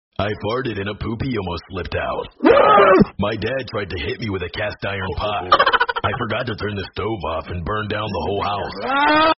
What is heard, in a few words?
farted low quality